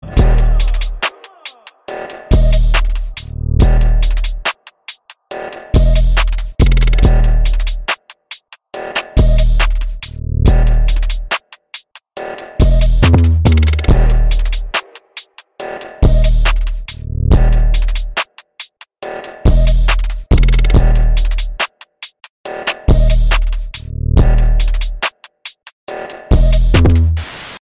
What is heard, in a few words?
beat; among us